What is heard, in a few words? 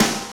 DANCE SET, DRUMS